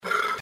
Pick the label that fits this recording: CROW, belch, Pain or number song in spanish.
belch